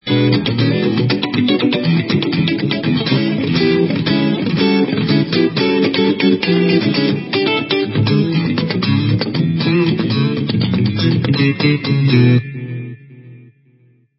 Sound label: Telecaster Funk rhythm; "Best Licks - Intuitive Guitar" Series